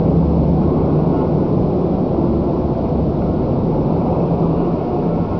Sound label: horns; laheat